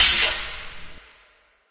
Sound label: spark, coop electric; player